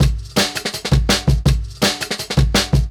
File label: 165, Jungle Breaks